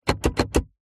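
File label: vehicles car gear stick automatic wiggle wobble interior toyota rav4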